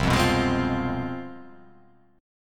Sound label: C Major 7th Suspended 4th Sharp 5th, down